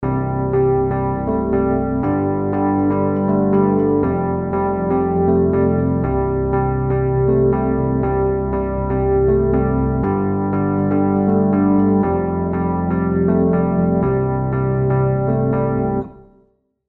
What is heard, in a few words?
EZkeys Electric Grand Synth Pop; MK Seven Dimension